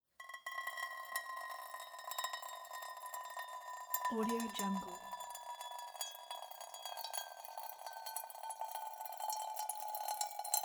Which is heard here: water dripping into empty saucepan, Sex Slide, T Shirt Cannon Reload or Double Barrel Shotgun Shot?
water dripping into empty saucepan